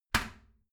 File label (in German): toilet seat lid close heavy, badezimmer